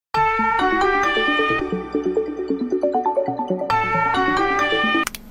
HUAWEI

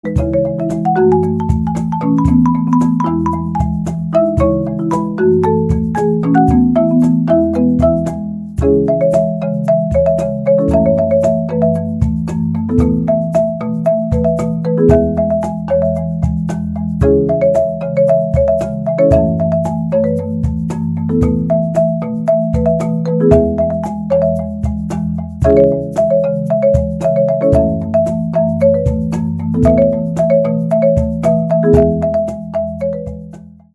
Marimba

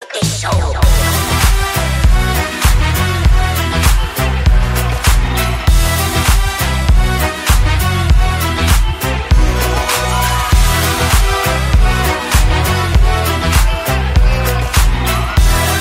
Harmonica

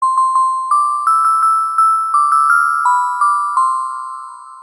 Christmas Tree SMS

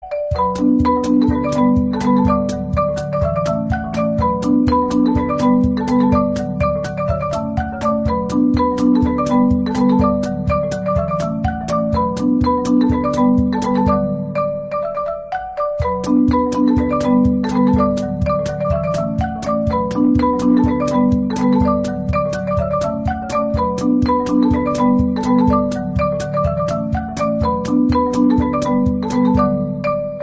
Marimba Cover

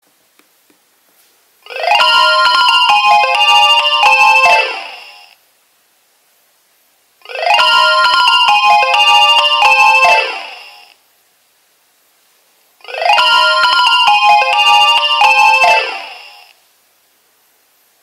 Nokia 2300 Ringtones

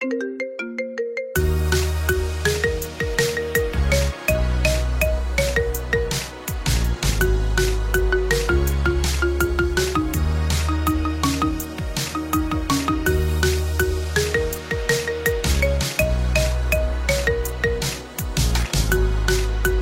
Marimba Remix

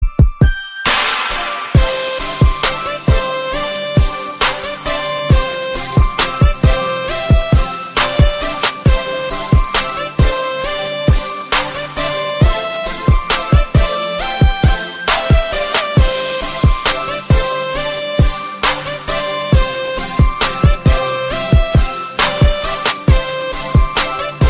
Christmas Hip Hop

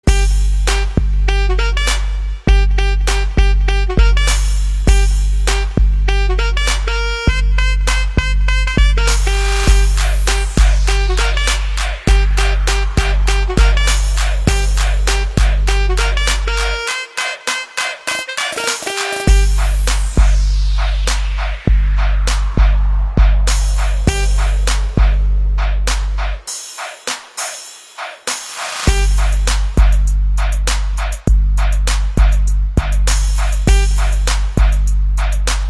Instrumental

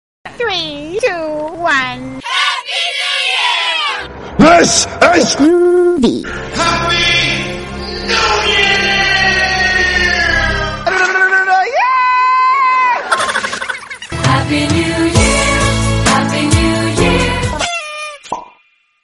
Funny Happy New Year Mix

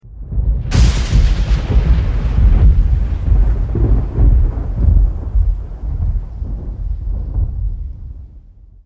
thunder with lightnings

